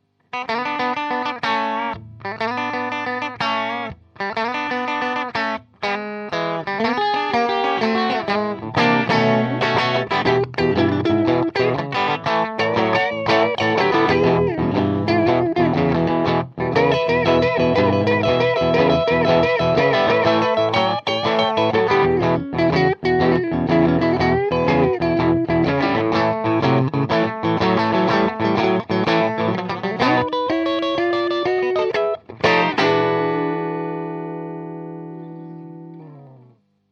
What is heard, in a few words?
Tweed Tele